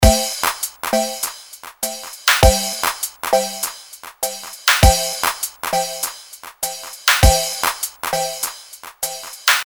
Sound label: delay